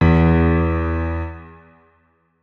notes, keyboard piano; Hideout Furniture

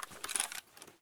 draw, weapons, toz106; Boomsticks and Sharpsticks

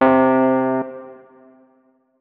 notes, keyboard, electric piano

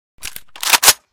unjam, weapons; FN F200 Reanimation; librarian f2k

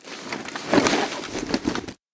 heavy, Vaulting; ledge grabbing; Ledge Climbing Mantling

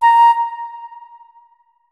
flute notes; Hideout Furniture

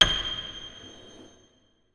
notes, keyboard piano; Hideout Furniture

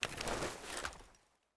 close, weapons spas; Spas-12; Spas-12 Reanimation

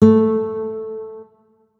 notes, guitar; Hideout Furniture

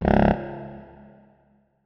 notes, saxophone; Hideout Furniture

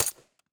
dmr, weapons bolt; JSRS Sound Mod

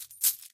artefact

